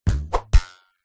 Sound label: cartoon arrow bow release whoosh past hit target with twang